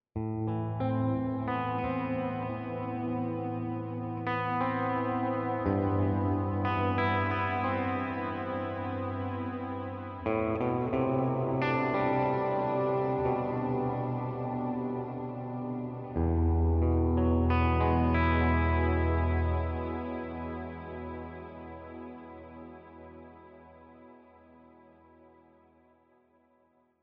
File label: Nocturnal Reverb